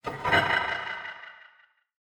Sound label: mobile game action wrong lever pull